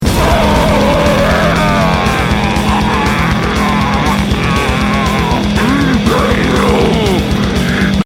violins

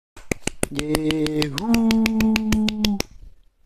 applause

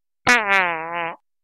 random fart

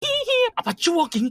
hee hee

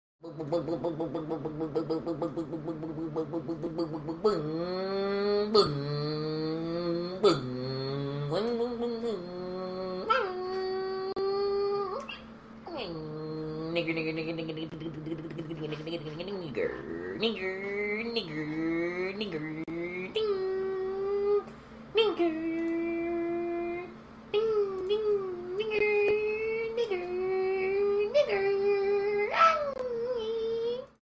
motorbike

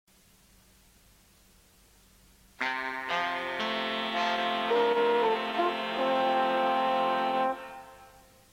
television ident; thames

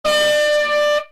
horn

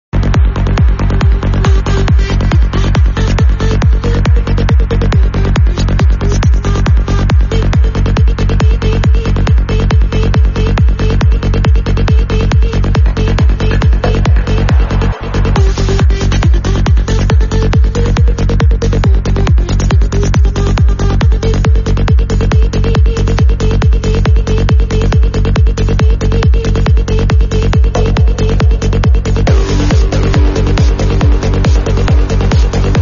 techno tribal dance

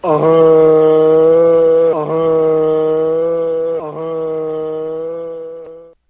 honk; fonzie

